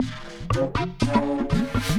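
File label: Music Base 120; from dust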